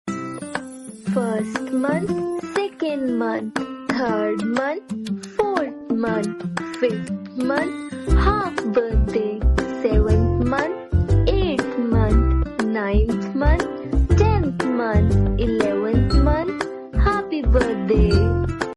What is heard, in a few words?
song; baby 1st birthday reel